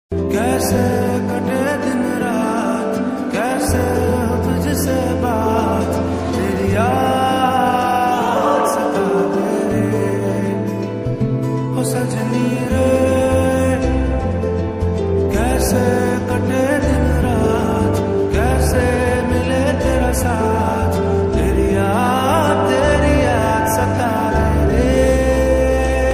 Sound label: lofi song slowed reverb